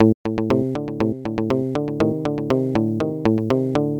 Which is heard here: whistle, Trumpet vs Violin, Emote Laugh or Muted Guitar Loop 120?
Muted Guitar Loop 120